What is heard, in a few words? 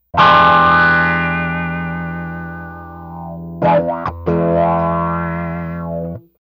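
electric guitar wah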